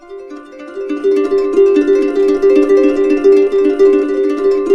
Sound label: D, Harps; Complete Harps; USB Soundscan vol.62; AKAI